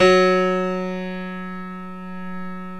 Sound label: GRAND PIANO; OMI - Universe of Sounds